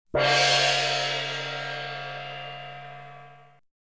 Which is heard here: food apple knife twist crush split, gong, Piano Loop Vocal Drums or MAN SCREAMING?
gong